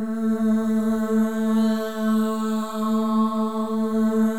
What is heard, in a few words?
MOVING V3, Symphony of Voices; Spectrasonics